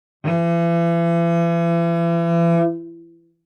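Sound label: Cello arco fortissimo sul G E3; MIS Pitches - 2014; Strings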